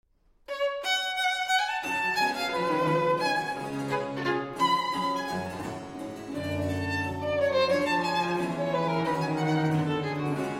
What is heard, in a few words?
Violin Sonata in G Minor, Allegro; Sonata “Leufsta”